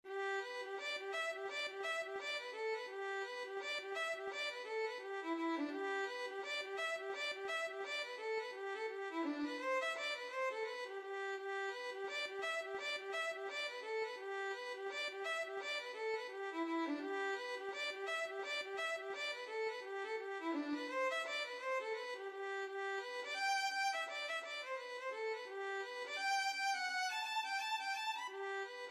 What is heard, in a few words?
hornpipe violin